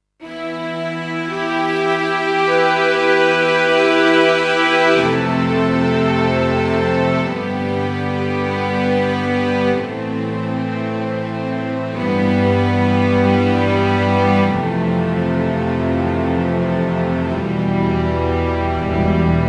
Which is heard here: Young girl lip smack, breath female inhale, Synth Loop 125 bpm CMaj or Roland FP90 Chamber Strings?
Roland FP90 Chamber Strings